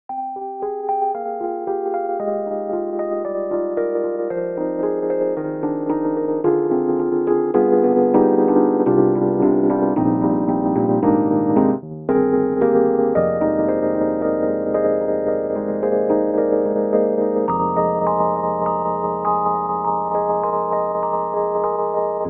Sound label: Mr.Suitcase; EDIROL; Super Quartet